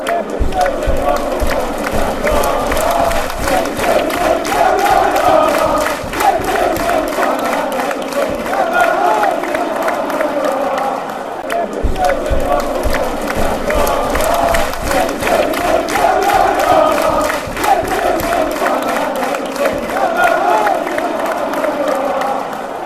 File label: stick yer camera, fanchants